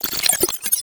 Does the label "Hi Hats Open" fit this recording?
No